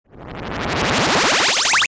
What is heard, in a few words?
Power Up Ascend Chopper Sweeper; Rise And Dive; Blastwave